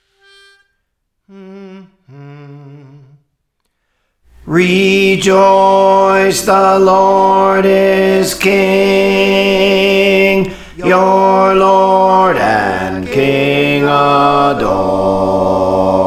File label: Bass, Praise For The Lord Training